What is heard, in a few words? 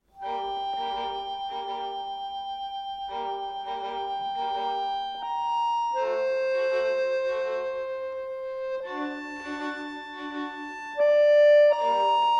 Adagio E-Piano; Концерт №2 Лето